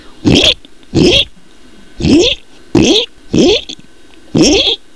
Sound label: PIGLETS